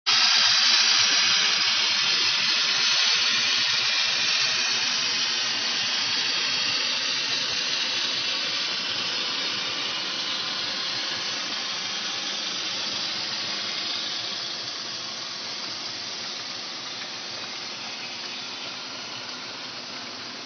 dropoff powerboat; chrissy

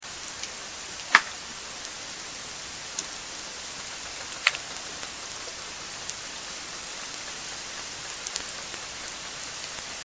snaps, orca

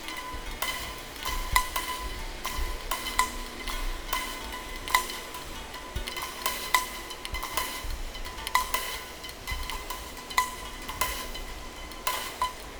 about 200m

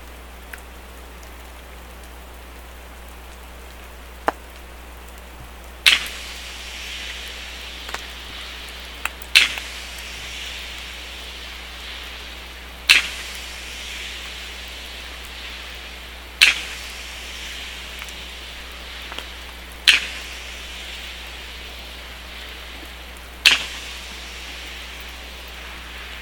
15 clicks, sperm; Yukusam

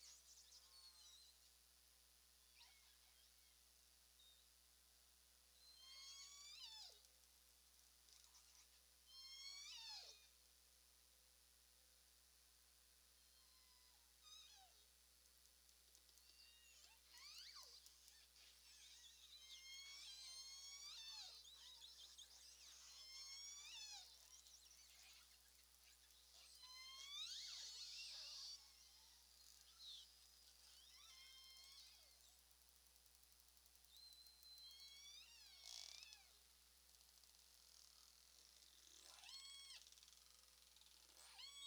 calls

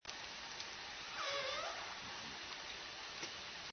transient call

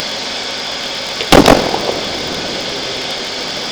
bang echo

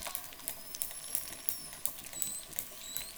click train, SRKW; biophony; Reson LK

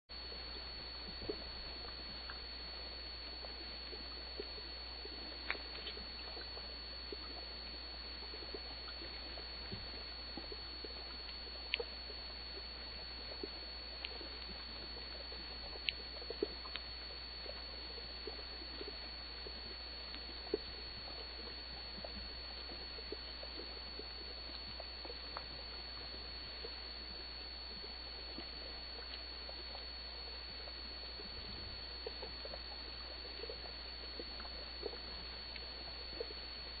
faint whistles maybe

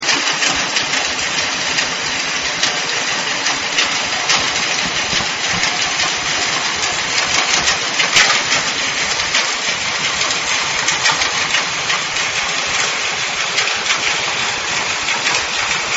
ship loud

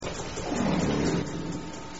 lowgroan, transient killer whales; salish sea

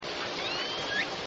L pod stereo, SRKW

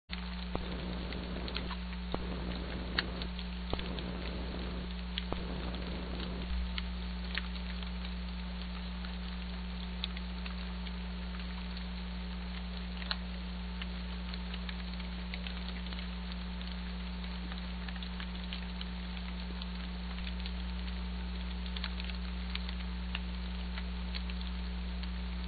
mystery seal start maybe

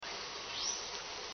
upsweep

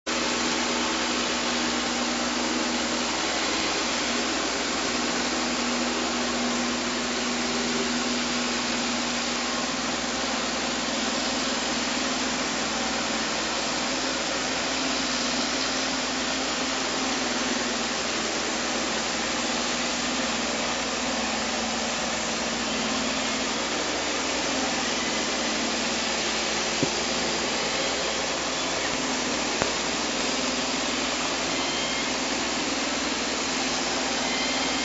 some clicks

